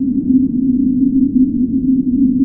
WINTER WIND; Pro-Rec - Synthsonic; JV FILM

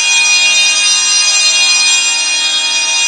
D#3, TURBOBELLS; Northstar - The Wizard VOL-1

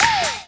SNAP; Best Service; Dance Mega Drums; SNAPS

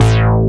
RV BASS

